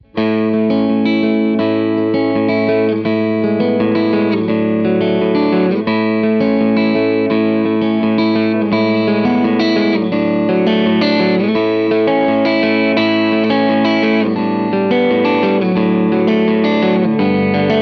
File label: Fender 2 Bassman Fingerstyle; AmpliTube; 真空管アンプ